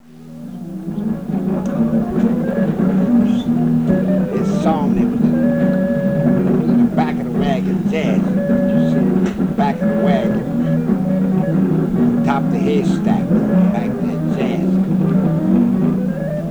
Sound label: Jazzy